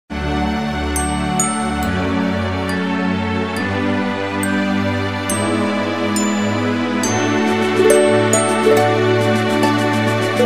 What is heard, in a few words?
donut polka; smw